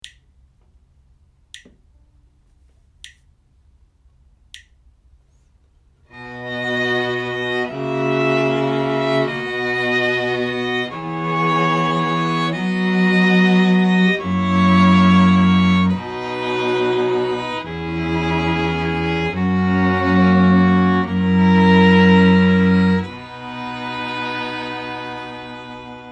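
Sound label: harmonic error detection, string quartet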